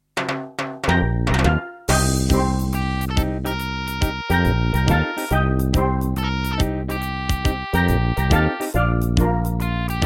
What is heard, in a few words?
Terratec WaveSystem SCW001-2; Eco Quest